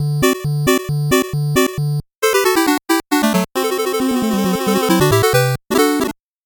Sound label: 8bit jingle normal